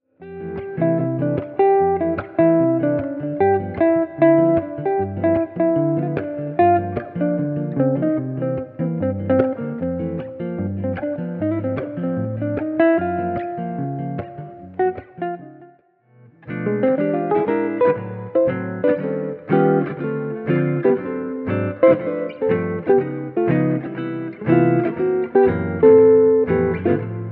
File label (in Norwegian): Medley kun gitar